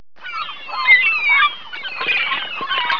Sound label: gull